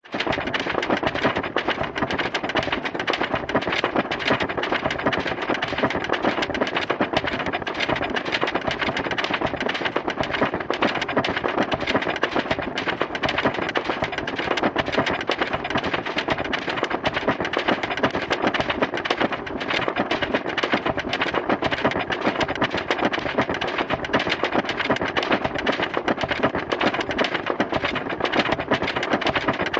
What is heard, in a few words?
leisure roller coaster hill lift chain pull operate